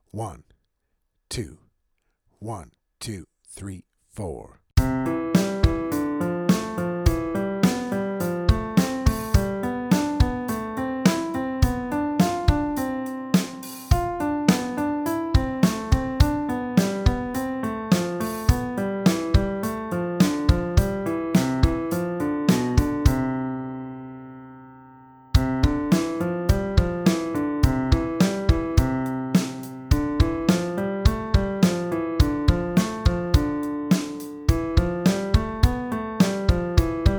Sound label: triad etude backing track